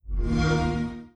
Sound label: XBOX Two Startup; Video Game Consoles Startup